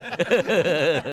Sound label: Laughing; Om Puri; Awara Paagal Deewana Movie Meme Templates